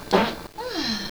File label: fart ahh